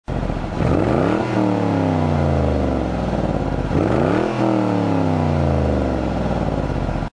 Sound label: Rev, Mustang bullitt